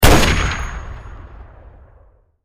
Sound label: rifle sniper fire